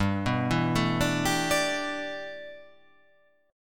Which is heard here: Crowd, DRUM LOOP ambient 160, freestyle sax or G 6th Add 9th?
G 6th Add 9th